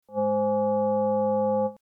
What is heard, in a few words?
interpretive tonal